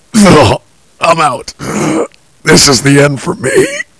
Die; USEC

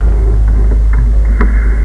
train

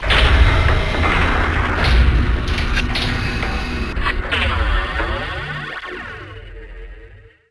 door

